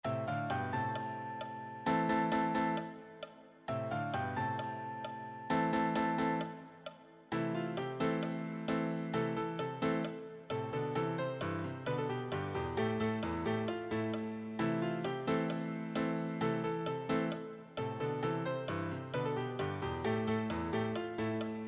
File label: solo, piano